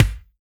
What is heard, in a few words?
BEAT KICK; B.BEAT